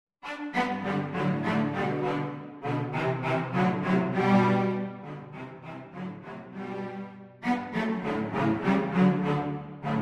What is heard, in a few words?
cello trio; Allegro; mozart